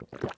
drinking

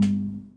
lamp sodium stop

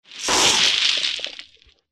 methcrate fill

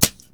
pbfire

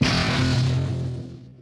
generic exhaust

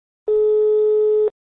tone, phone

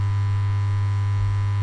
lamp led loop

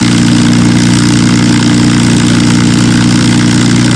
r7 petrol small, engines; acf engines

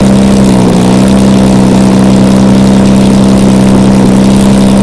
i1 large, engines; acf engines

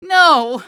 die female

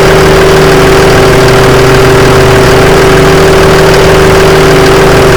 i5 diesel small, engines; acf engines

